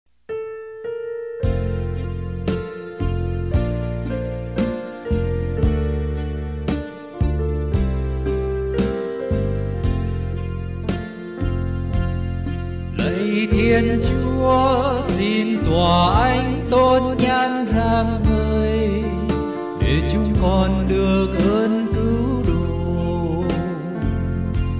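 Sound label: soprano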